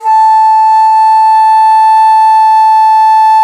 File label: DIZI; E-MU Producer Series Vol. 7 – Old World Instruments